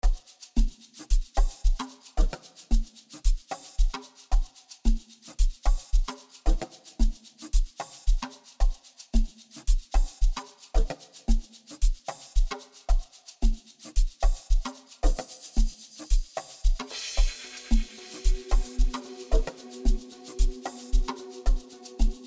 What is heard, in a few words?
Bass Test